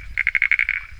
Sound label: Bokermannohyla sazimai